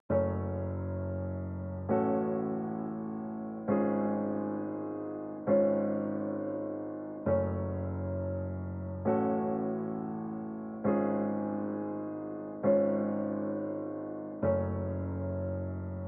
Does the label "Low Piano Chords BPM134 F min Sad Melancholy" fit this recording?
Yes